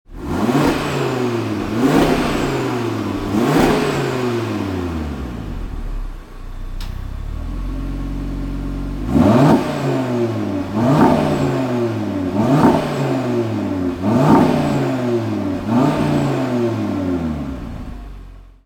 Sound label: revs, audi rs3; carbon black daytona grey tech pack pro